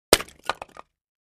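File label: 2x4 Smashed Sledge Hammer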